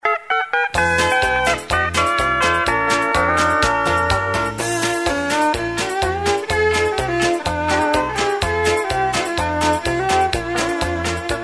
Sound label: music